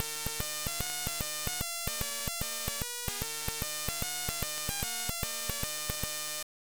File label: Title AY Beeper; The Arc of Yesod